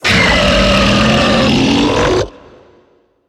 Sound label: beast vocal switch side